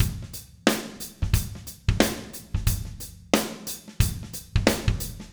090 Shuffled Rock Drums Verse; Pop Rock; Moderate Tempo; Pro Tools Essential Loops; Digidesign

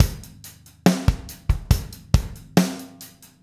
070 Slow Rock Drums; Pop Rock; Slow Tempo; Digidesign; Pro Tools; Pro Tools Essential Loops

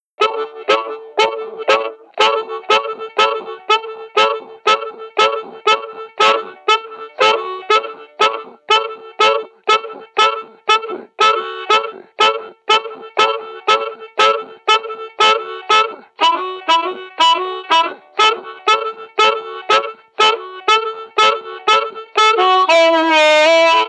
Blues Harp; Digidesign; Pro Tools Essential Loops

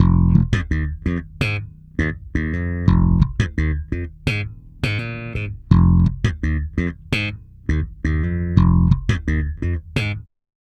084 Pop E-Bass Fmin; Pop Rock; Pro Tools Essential Loops; Digidesign

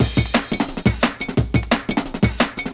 175 D&B Drums; Pro Tools Essential Loops; Dance DJ; Drum n Bass

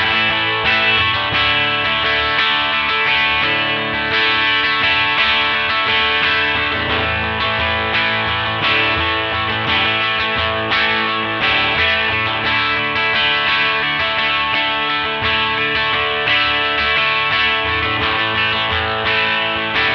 clean gtr EQ; Pro Tools Essential Demos; Digidesign

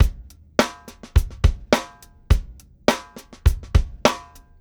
104 Pop Funky Dr-Groove; Pop Rock; Moderate Tempo; Pro Tools Essential Loops; Digidesign; Drums

